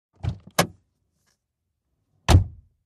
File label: Auto Door, accessories doors